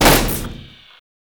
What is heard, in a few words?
Electronics Misc Destroy; Warframe; Ambience; NineteenNinetyNine